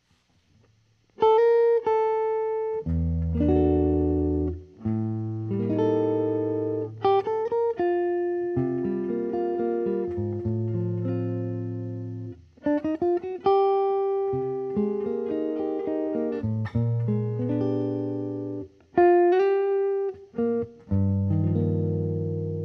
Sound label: Amp Ebony Bridge; Amati